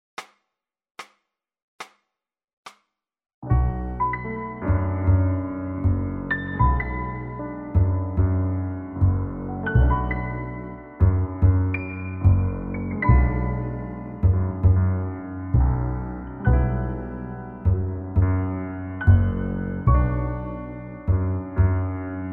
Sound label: flute